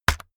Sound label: keyboard external key hit fast